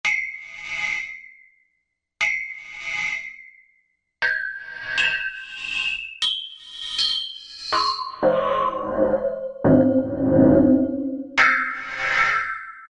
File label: Pole, Korg M1; Factory preset examples